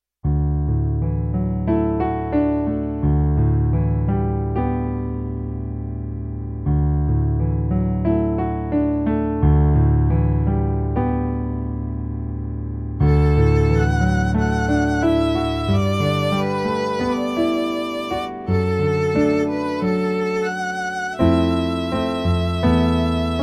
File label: violin